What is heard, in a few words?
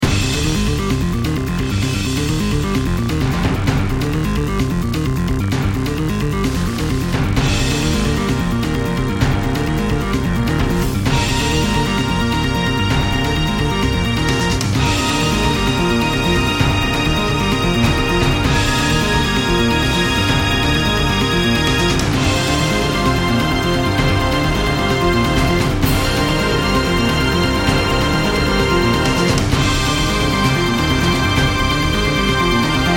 Suspense